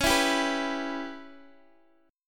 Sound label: Db Minor 7th Flat 5th Db down Guitar Open G